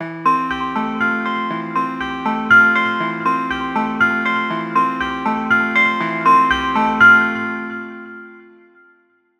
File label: Bell Like; Cherry Audio